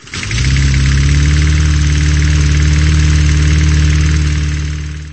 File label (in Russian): gaechnii klyuch odinochnii vibriruyushii